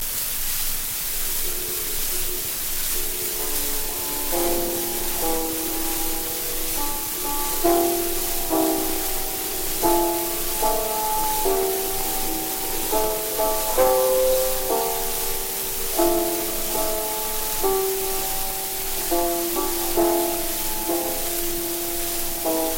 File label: Gramophone